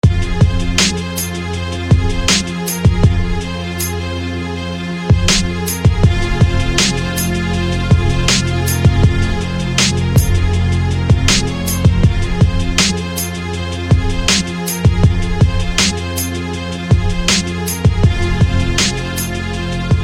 Stringz; SFB FX

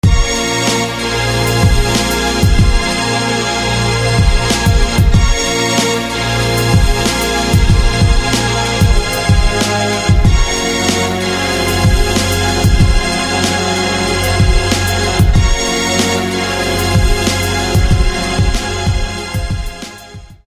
Abrasion Synth; SFB FX

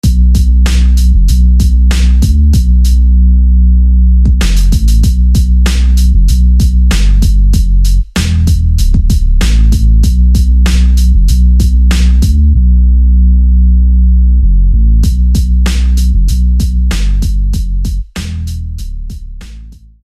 Bass; Bass Murder; SFB FX

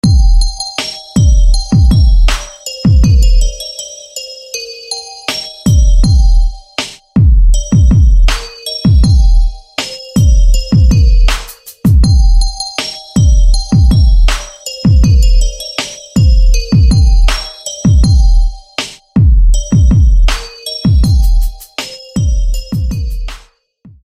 Grotto Bells; SFB FX

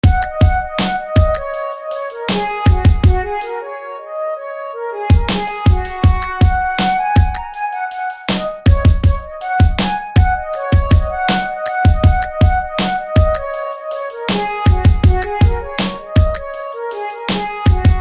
Platinum Wind; SFB FX

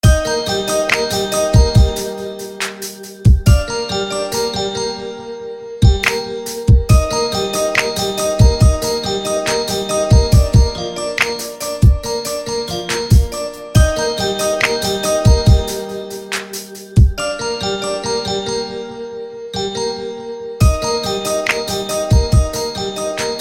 Vibra Blaze

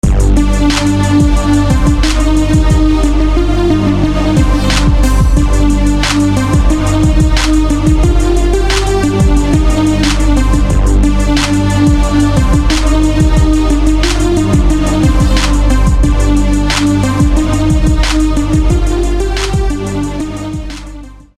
Phat Synth; SFB FX

